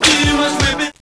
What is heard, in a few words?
whip hit